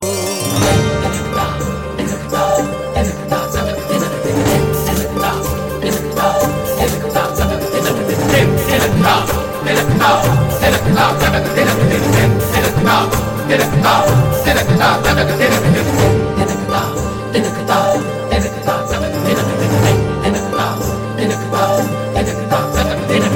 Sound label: Dhim Tinak Dha